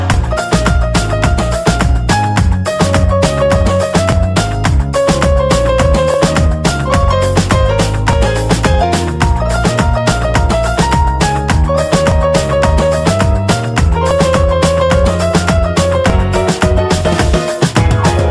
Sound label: BG Musiq